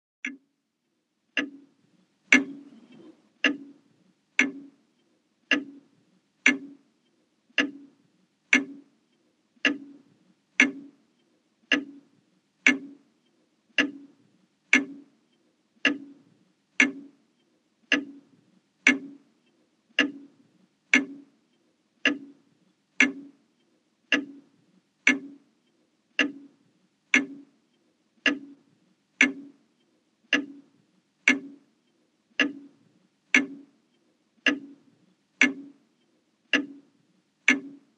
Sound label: Clocks Pendulum Tick, pendulum